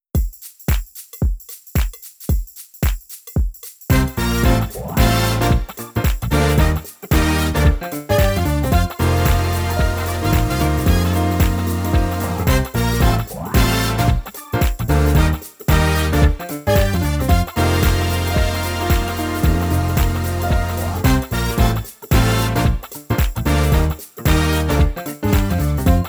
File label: drumless